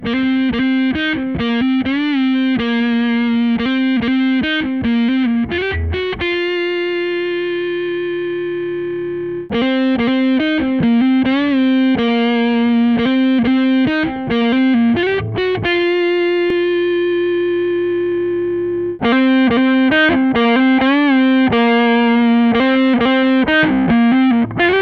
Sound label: lead bridge